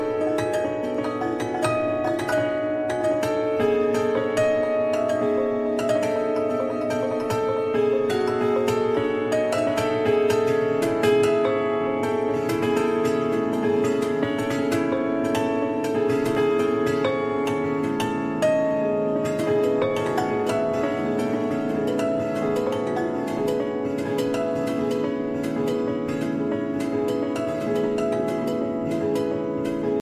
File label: Love Birds (acoustic)